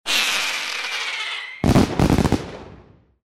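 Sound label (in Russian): одиночный взрыв с дребезжащим горением фитиля; Звук петард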